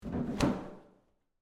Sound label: Toilet Paper Roll, Office, Toilet, Paper, Roll, Rip, Sheet, Public, Bathroom; Public Bathroom Sound Effects; BlastWave